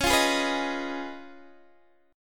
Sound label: Db 9th Db down Guitar DADGAD; strummed